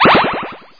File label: shotspotter